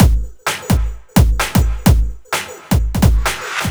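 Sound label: No Percussion, Drum Loops 129bpm; Zero-G; Soundclash Nu Dance Electro; Acidized Wavs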